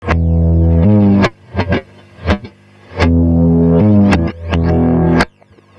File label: FX DIST; FX DISTO